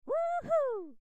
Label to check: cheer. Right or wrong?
Right